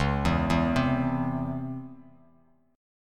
Db Minor Major 7th, down, Bass Standard; DbmM7 arpeggiated; JGuitar

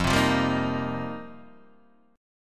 F 9th Suspended 4th F Guitar Open D, chord

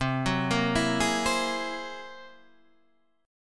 F sharp 9th Flat 5th, C

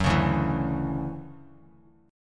D-Major 9th D down Bass Standard; DM9; strummed; JGuitar

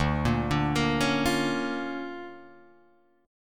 D Minor Major 7th Sharp 5th, D, Guitar, Drop D

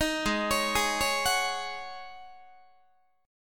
Ab 7th Suspended 4th Ab down Guitar; Ab7sus4 arpeggiated; 18,16,16,18,17,17

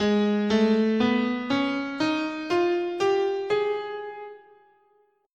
Ab Neapolitan Major, scale

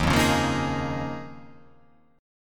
D sharp 7th Suspended 2nd Suspended 4th

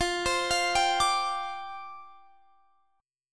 C Suspended 2nd Suspended 4th, F

